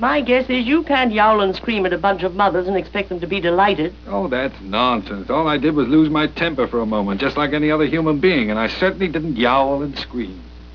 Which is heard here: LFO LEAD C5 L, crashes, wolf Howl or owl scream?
owl scream